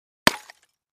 Clay Chimney Vent Hit, concrete & brick

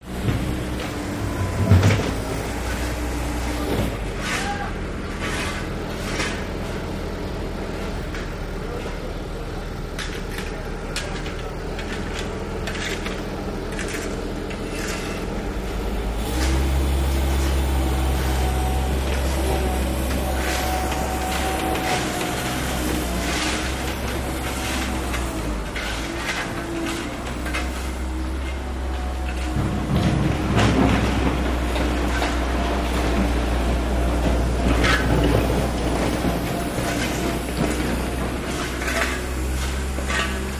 Const Site Street Repa, construction small; Construction Site Street Repair Ambience

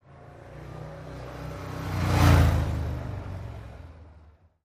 Harley driveby

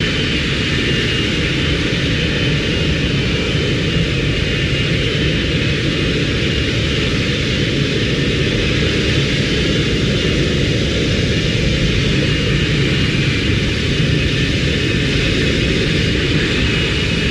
737 Jet Ext Loop Take O

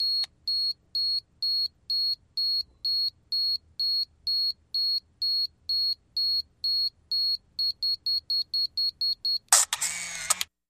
35mm Camera Beeper; shutters & movement

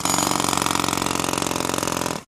Jackhammer Shrt

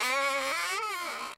Door Creak Reversed; hinges & creaks

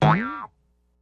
Rubber Boing Twang; boings / twangs / snaps

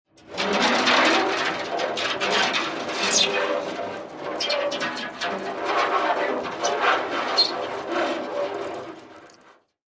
Dry Ice: Eerie Metal Raspy Nois

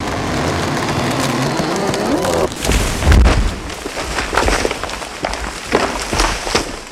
Tree Fall Cut Shorter For Quick Fall

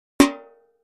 Impact Metal Bucket; barrels buckets & cans

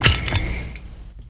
Flash Bulb Metallic, flash pops

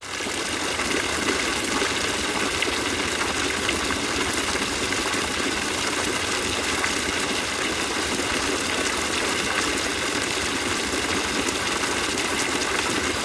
Water Brooklet Swift, streams

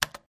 Computer Keypad Car, typing

